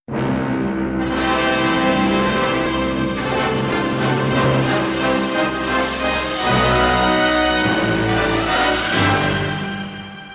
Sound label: Fanfare Intro